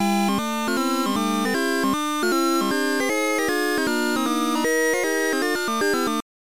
Game Start; Namco Classic Collection Vol. 1

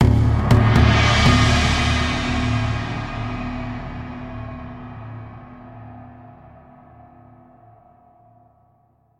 Level Up; delver

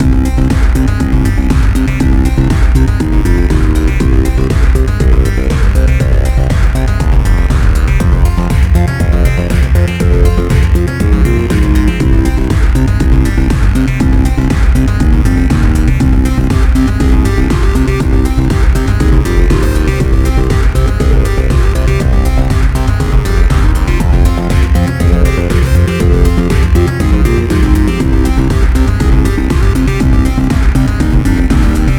menu Get Ready Selector Screen Loop; Hyper Drive The Insane Gravity Race

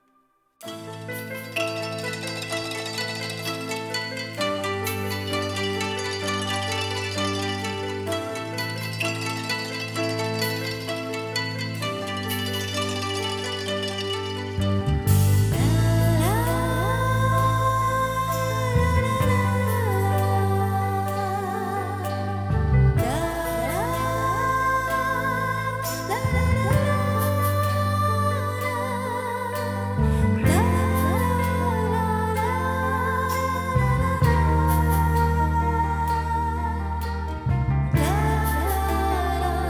Forest Chant of Tragic Love; tokyo majin gakuen denki 10th anniversary concert

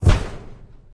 Fall Impact Metal; gamerip; Pain Train

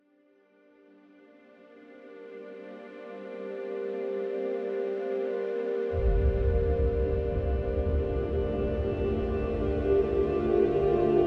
Exploration

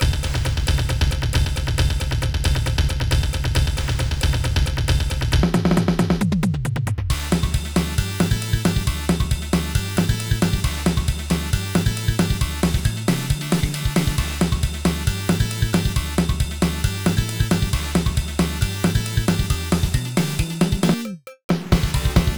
Broken Beats; PC-8801

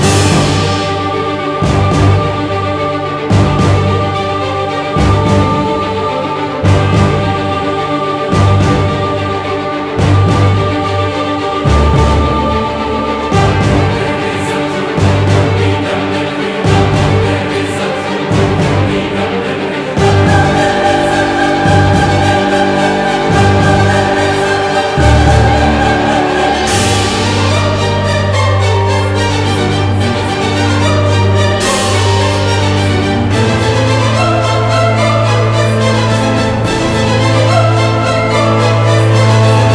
Boss Battle Loop

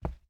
Footsteps Ruins; Main Character

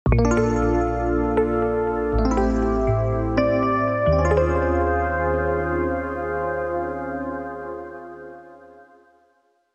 Game Over; Knocked Out

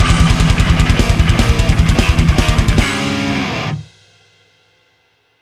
Plane Lost Sting; rogue aces

